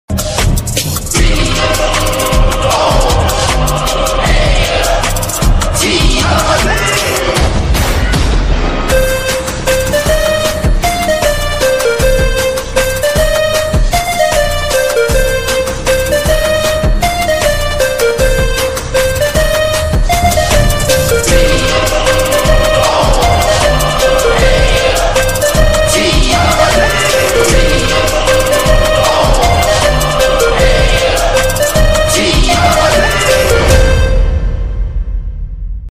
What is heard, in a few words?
mass bgm in piano; the greatest of all time